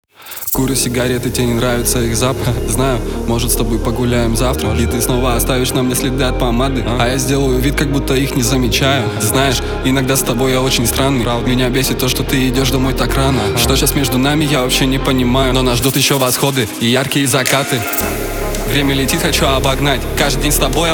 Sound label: Remix